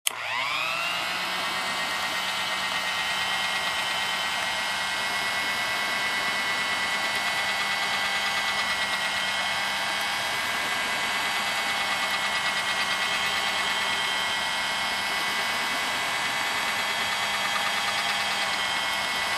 Electric Hand Blender turned on

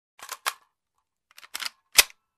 Reloading machine gun

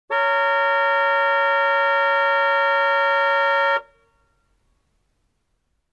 Car horn long

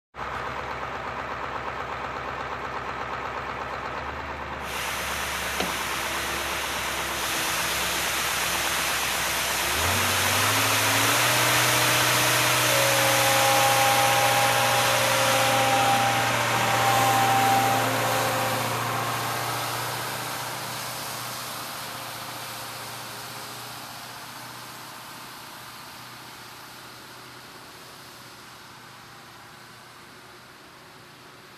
Street cleaning vehicle

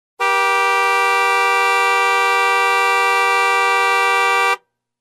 Car Horn long honk

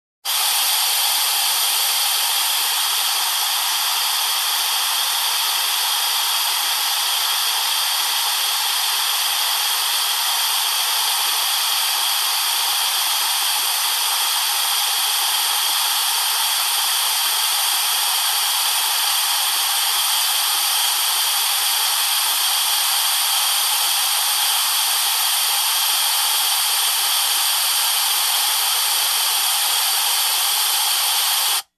Heavy Oxygen Hiss

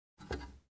Opening Fuel Cap

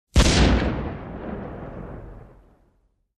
Cannon Blast

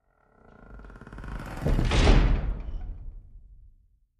Warehouse Creaky Doors closing

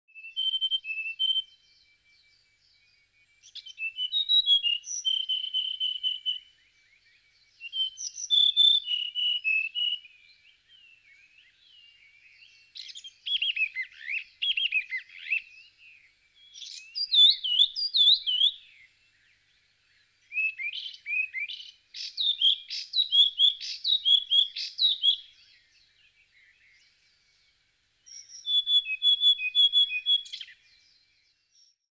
Birds chirping and whistling

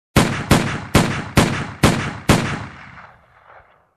Mutliple gun shots

